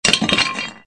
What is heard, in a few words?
cooker; openspace3d; kidkitchen